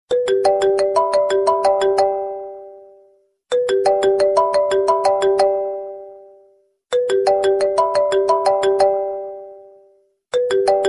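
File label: New Samsung mobile, ringtone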